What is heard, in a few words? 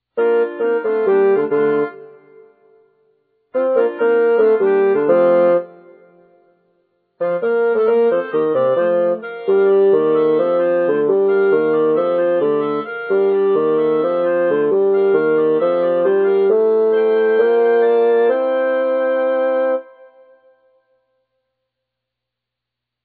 bass; pfautsch